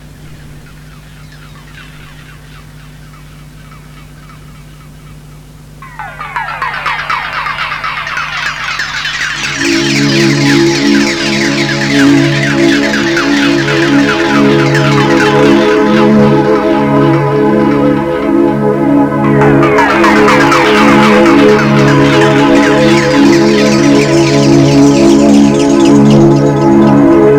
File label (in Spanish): muestra sonidos hemisinc